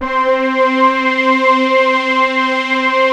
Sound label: film dark C5, film string; Best Service Dream Experience